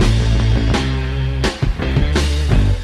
guitar rock, Loops

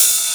Hats Electro Open

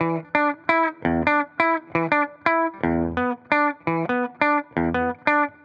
guitar heartbreat captain; Loops; Phonky

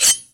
gush, Swish

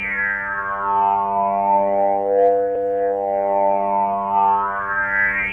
flanged, synths; Notes

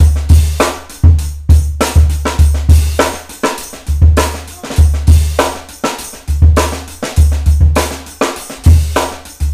loud hooting, Drum loops, Funky

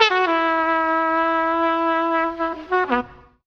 horn swallowed, Stabs

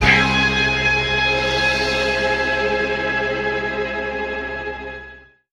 goblin sting

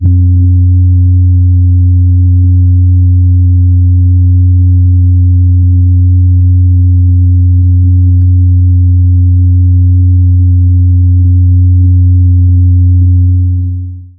kick, Heartbeats

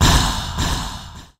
breath

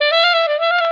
sax, Loops; Phonky

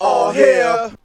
aw heh; ooh ahh

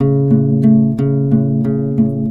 guitar tragic, Loops

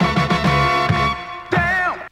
horns, phonky loops

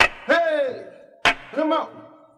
clap hey clap come on; Drum loops

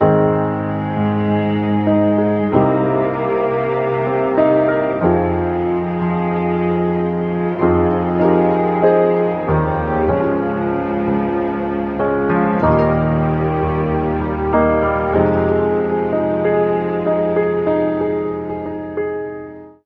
piano, Loops, Sad

